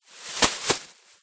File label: foley plastic bag very thin item drop in; zapsplat